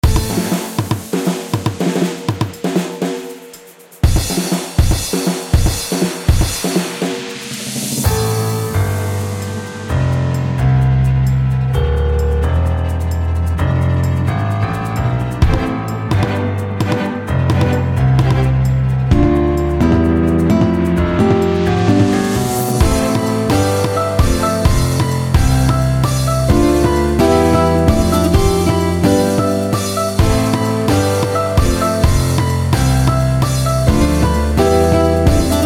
Delay Pedal

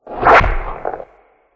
Tennis; Sfx Tennis

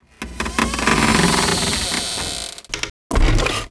Laddercar Attack; Knight Online

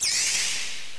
Twinkle; Touhou Jitsuzaisou Dream Logical World

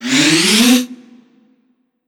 Satyr Flute Soufle

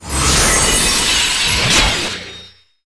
Ice Orb Cast

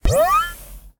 Drone

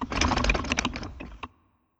Tank Motor

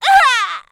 Enemy Generic Human Death Crushed

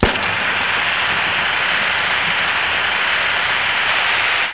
Car - Nitro Burst

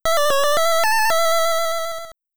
Flute Melody (NES Version); famicom nes; FDS; gamerip; Zelda II: The Adventure of Link

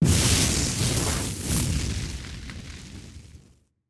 Armor Strike Cast

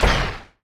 Small Rocket; battle suit aces

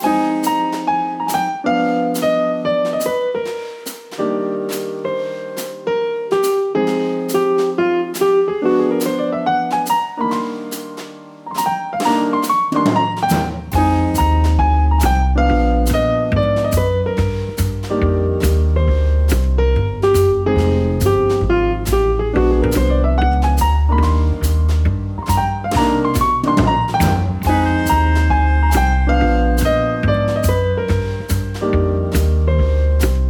Elevator Jazz; Car Dealer; JDM Japanese Drift Master

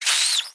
Rat Death

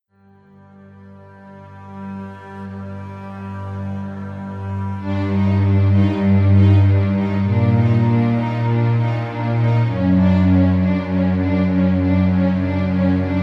Tension; arthurs quest battle for the kingdom

